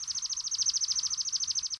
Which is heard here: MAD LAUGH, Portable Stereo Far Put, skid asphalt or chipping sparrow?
chipping sparrow